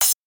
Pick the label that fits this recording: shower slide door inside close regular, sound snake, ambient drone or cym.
cym